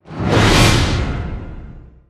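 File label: sting, percussion; transformers war for cybertron gamerip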